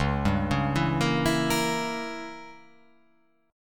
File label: C sharp Diminished 7th C sharp down